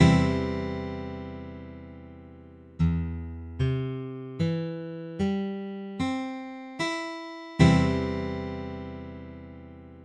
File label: CE, Guitar Chords